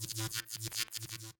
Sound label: robot charging; factorio 2.0.55